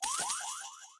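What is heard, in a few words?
Goblin super, 技能音效 高手形态合体爆金币音效; 哥布林; 绿野世界; 部落冲突; 皇室战争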